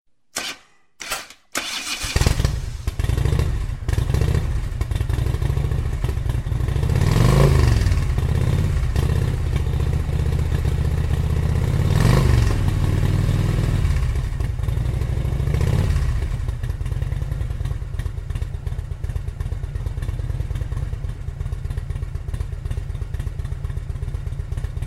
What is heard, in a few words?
Harley Davidson Real